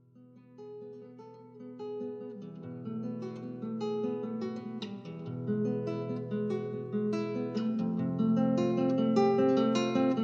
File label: flamenco